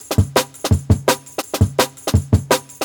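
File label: Jungle Breaks, 168 bpm; Original Jungle Breaks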